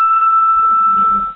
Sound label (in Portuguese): agudo